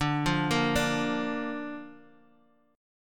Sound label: D Minor Sharp 5th D down Guitar; Dm#5 arpeggiated